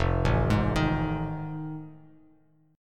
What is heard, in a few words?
F 7th Sharp 9th, Bass Standard; F7#9 arpeggiated